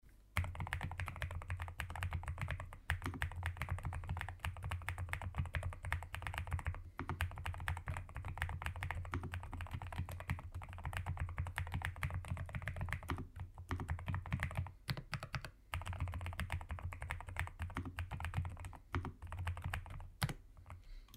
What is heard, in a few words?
kick75, nuphy kick75 review